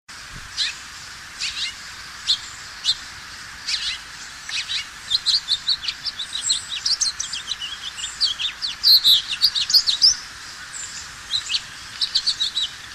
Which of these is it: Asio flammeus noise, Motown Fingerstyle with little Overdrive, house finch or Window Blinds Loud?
house finch